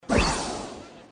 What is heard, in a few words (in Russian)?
anime zvuki